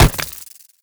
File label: body impact, headcrab black movement